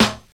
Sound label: tight snare a key; snare drum samples